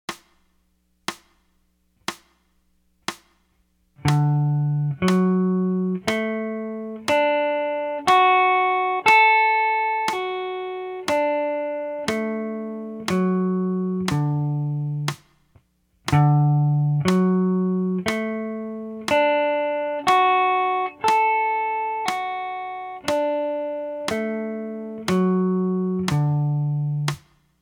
arpeggios; chords; lesson central

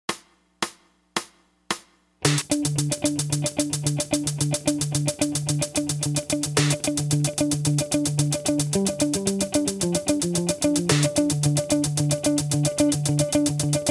delay

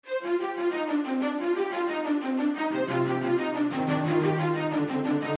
line string

